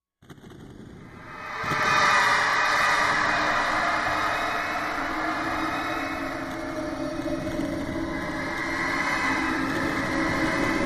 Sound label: Ghoster Coaster Haunted Roller Coaster Ambience Screams Movement